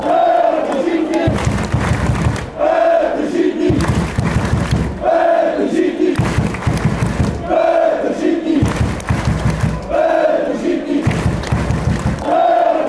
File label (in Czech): jsme tu vsichni, chants